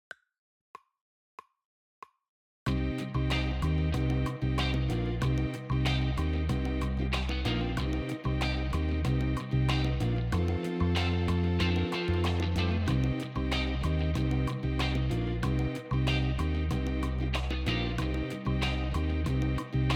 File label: drumless